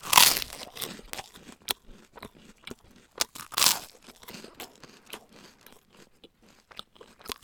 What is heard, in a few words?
eating